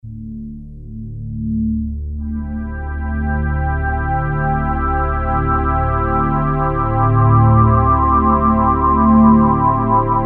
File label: Cosmos Juno; Factory Performance Patches; Factory B